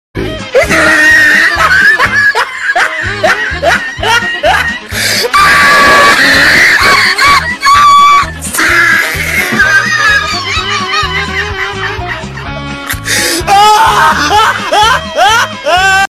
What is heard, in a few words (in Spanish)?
risa exagerada